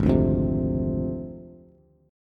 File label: Ab 6th Flat 5th Ab, Bass; strummed; JGuitar